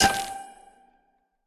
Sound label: match sequence sfx; Candy Crush Friends